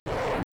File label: Scratching Mochila